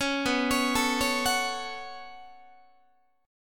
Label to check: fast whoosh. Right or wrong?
Wrong